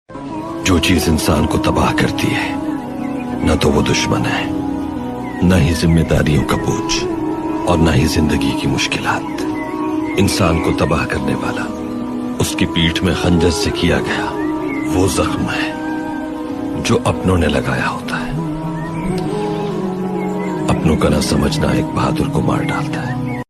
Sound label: beautiful islamic ringtone